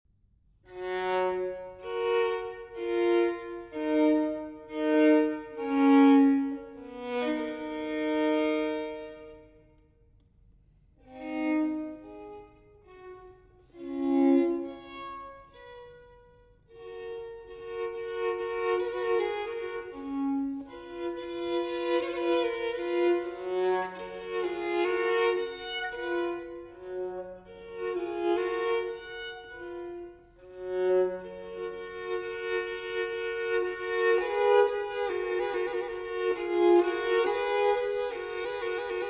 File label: Solo Violin in G Major Largo; TWV 4015; Telemann Fantasia